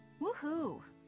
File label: woohoo